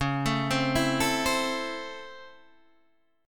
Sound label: Db Minor Major 7th Sharp 5th Db down Guitar Open D